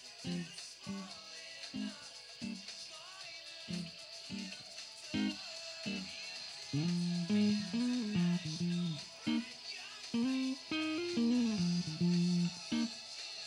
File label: Amp Silver noise; Kemper